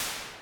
snare, Drum Machines, Clavia Nord-Drum; 300 Drum Machines

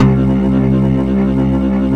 big organ

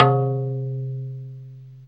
BAMBOO, BAMBOO GAMLAN; Zero-G Ambient 1

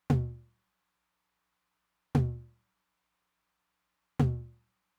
Mid Tom, Roland TR-909; drum machine; Roland TR-909 Ableton Project

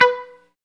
GTR Mute; Club-50 - Foundations; Funk & Mute; Chunk Mute

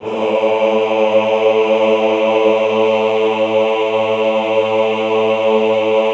AHH A1 R, Classical Choir; Best Service; Extended Classical Choir; AHH LO+HI LL

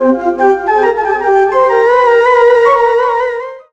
SYNTH, ARABIC; USB Soundscan vol.43 - ArabianTraditions; AKAI

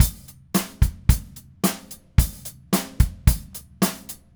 Red Maple Groove 110, DW Red Maple Kit

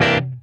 CHOP, Funk Guitar; Zero G - Funk Guitar

